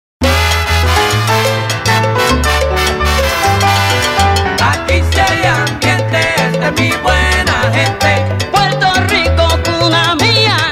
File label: Ambiente